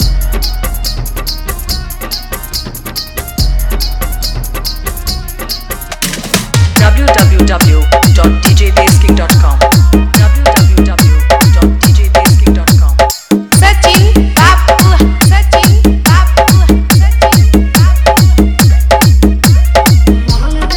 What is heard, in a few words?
Hard Vibration Bass Mixx